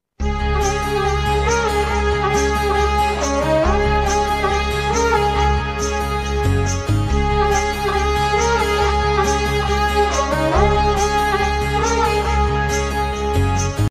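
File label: background music ringtone; Gadar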